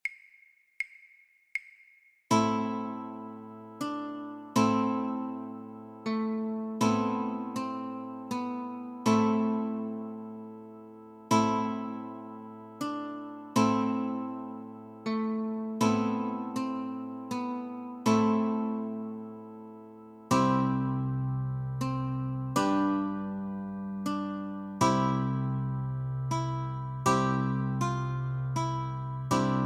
We Three Kings of Orient Are - Notes & Chor, guitar; christmas